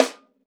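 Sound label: snare drum, Drums Acoustic; AKAI; 90s sample CD; USB Soundscan vol.10; RAW KIT 7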